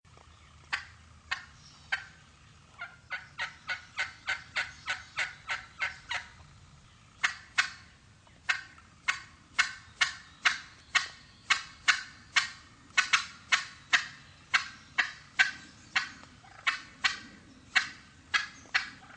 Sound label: turkey cutting; animals